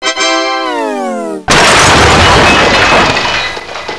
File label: Default Kaboom